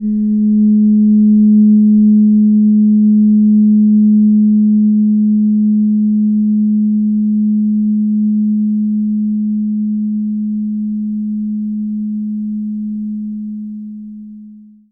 fork Mid Neptune

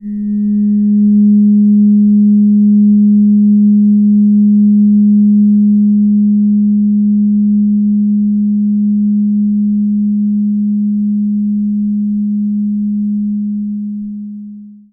fork Mid Uranus

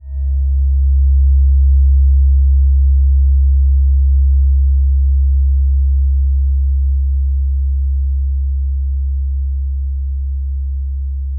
fork Low Ohm

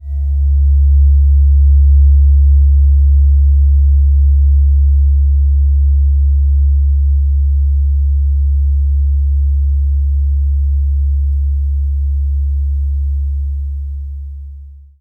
fork Low Pluto

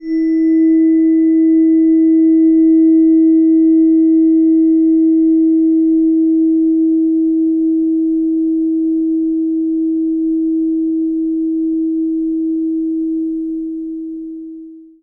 fork High

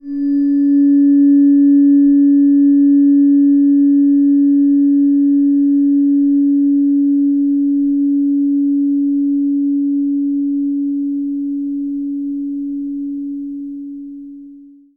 fork High; Mercury

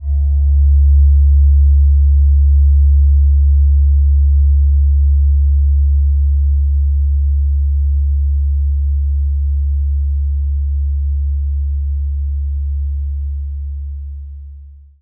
fork Low; Mercury

